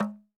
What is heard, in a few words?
TAM TAM, African Drums